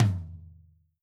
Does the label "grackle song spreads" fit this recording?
No